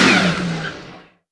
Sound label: shutdown, vehicles; Dystopia; brute